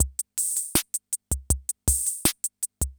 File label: CR78; Best Service; XX Large Most Wanted